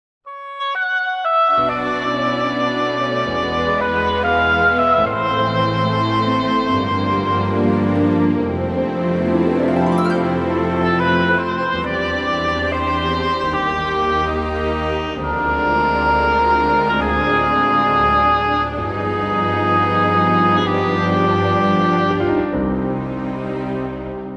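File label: C minor duet version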